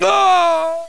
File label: death; cscz npc